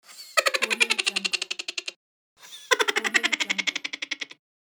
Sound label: cartoon laugh